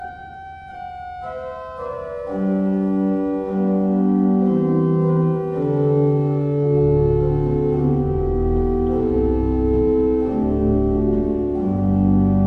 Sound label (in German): Walcker, gebrauchtorgel